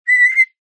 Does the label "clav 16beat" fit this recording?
No